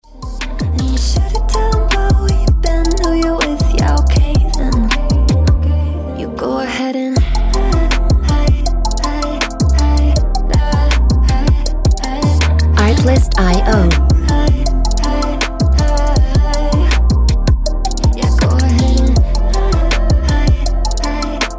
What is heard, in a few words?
Lie Ringtone